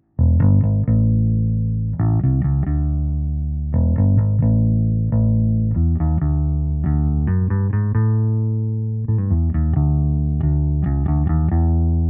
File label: Heritage Audio DTT 73 BassAmp U47fet